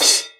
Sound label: Crash mute, EMU Orbit-3 drum machine; 300 Drum Machines